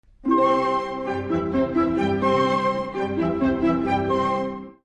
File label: Flute Harp Concerto Opening